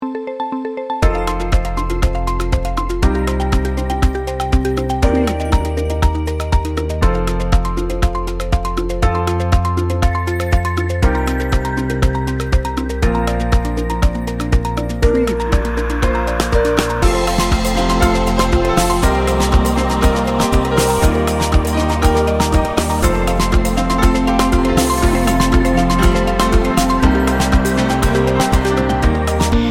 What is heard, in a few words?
inspiring and hopeful 30 second